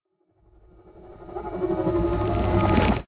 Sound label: death; WildBlood; betterbeholder; end additions